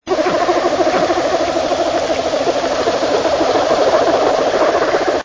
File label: bear cubs nursing